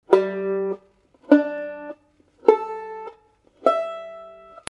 banjo tuning

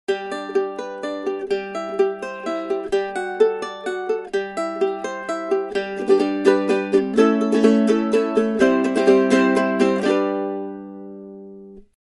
8st UKULELE

